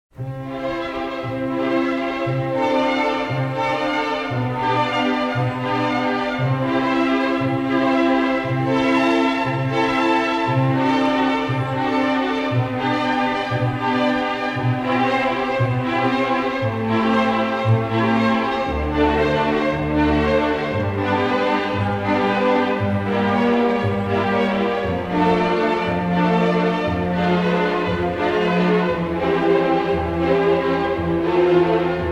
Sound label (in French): prelude nº 1 en ut majeur; bach